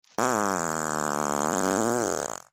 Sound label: fart